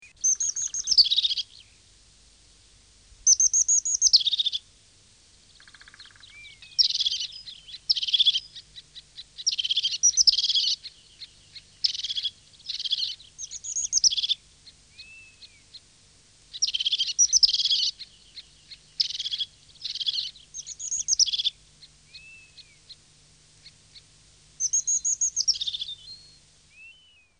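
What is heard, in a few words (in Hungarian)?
Búbos cinege; Madarak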